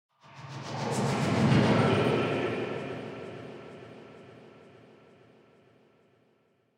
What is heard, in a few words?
Ghost passing ethereal movement